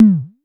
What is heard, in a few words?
vermona drm1 mk3 tom